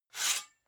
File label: sword sabre draw fast